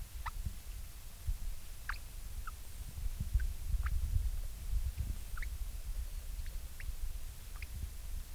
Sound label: selfgrooming, octodons